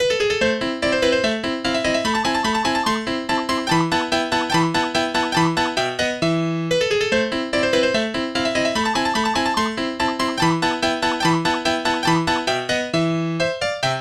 honky tonk

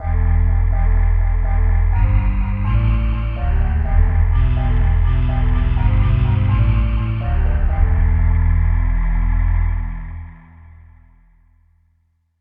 space voice bass

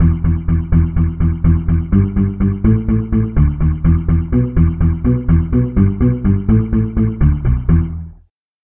steel drums bass

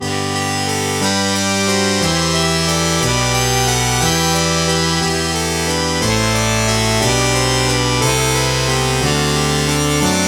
muted trumpet bach samples

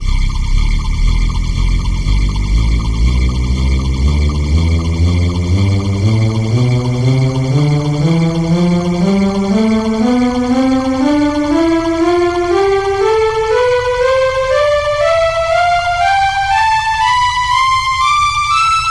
warm pad tonleiter

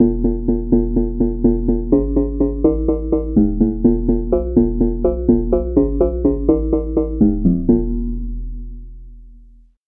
xylophone bass

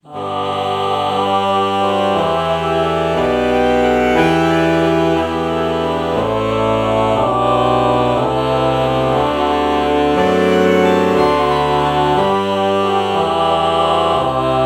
alto saxophone ah morph

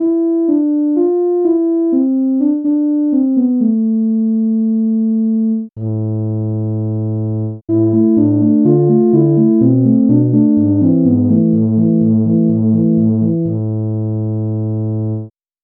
tuba

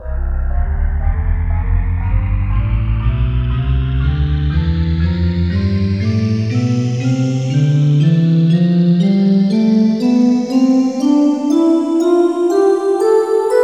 space voice

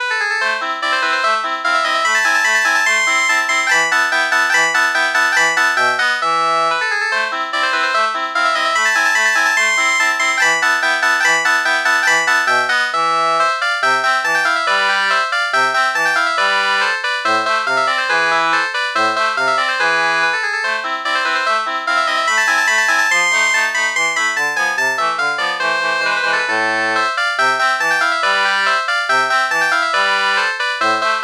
shenai

